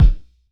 Thud Weighty, Kicks; Airwindows

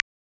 Dry click, Univox SR-95

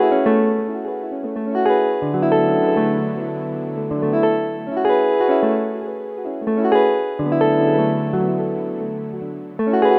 Synth Guitar; Sonic Foundry (Sony Creative Software); Mac Money; Guitars

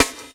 SD, 160 BPM, Drum & Bass; Ueberschall; Drum & Bass Flash Trackz

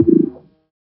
fx blonk

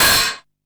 Hat, Drum & Bass

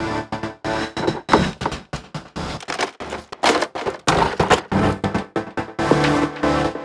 Envelope Beat; Drums & Drones

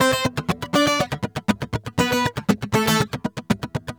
GUITAR SALSA

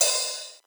Ride Cymbal

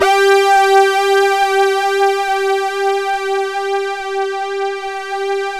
SYNTH PAD; Sound Gallery - Charged; SYNTH PAD 1

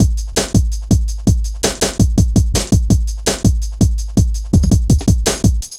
Ezee Rolla, Drum Loops

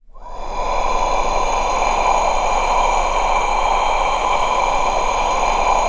O NOISE BED, VOCAL WIND; ILIO; Vocal Planet; Jazz & FX

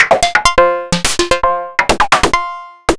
ms20 raw percussion

